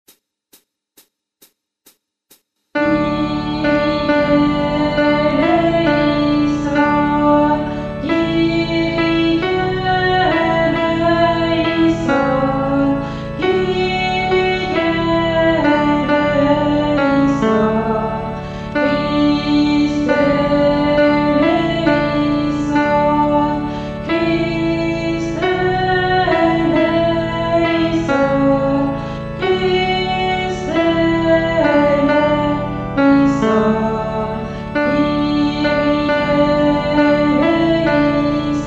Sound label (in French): Messe de St Boniface Kyrie Guide Chant Alti